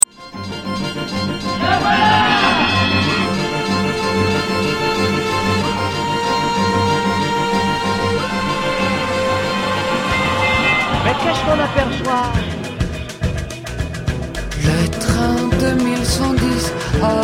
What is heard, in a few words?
train